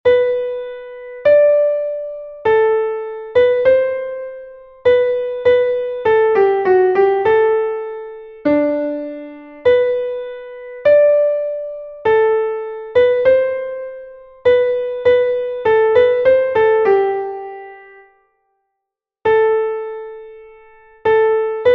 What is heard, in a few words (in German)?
klavier; MelodieMeister